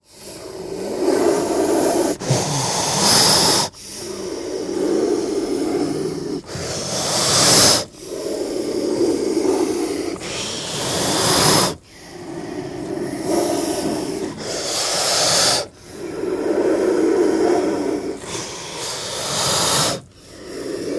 Cobra Breaths Hisses

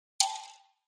Hollow Tap Rattle Rev

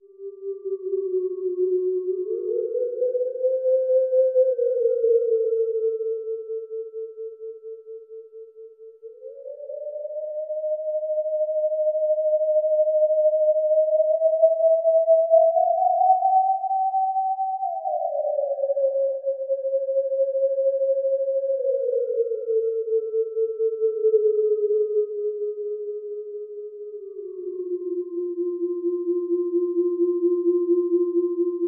ghost theremin, howling

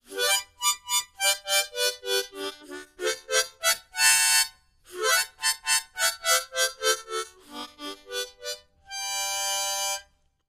Mouth-organ, Happy (Neapolitan) Melody; woodwinds harmonica

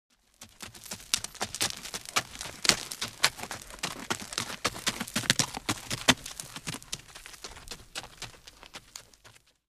By Horse Up Past Mic At

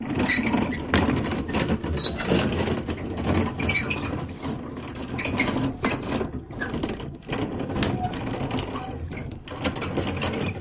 Rattly cart with squeaky wheels

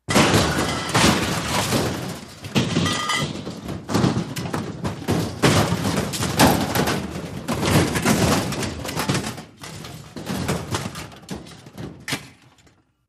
Big Junk Collapse, crashes & creaks

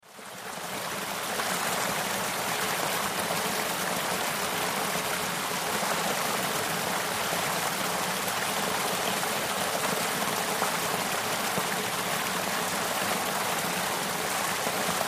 Waterfall

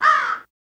Crow Caw Single

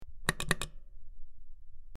Button Press In Out Sp, switch clicks

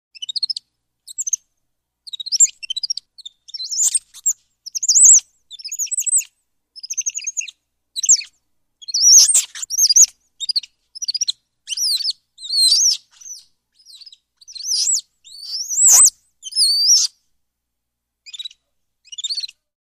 Monkey Capuchin Chit

